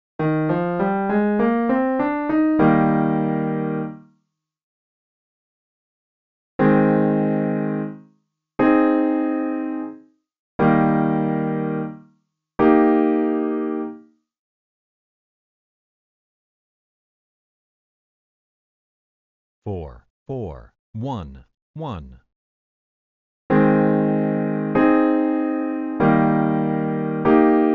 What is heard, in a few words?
I, IV, progressions